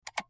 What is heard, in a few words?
Highpitched Mouse, mouse